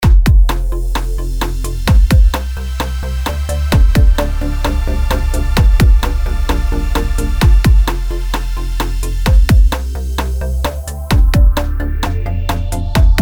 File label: Space Waves